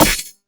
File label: Bullet hit glass; Hovl Studio; SFX Battle sounds; WhiteMan Unity2D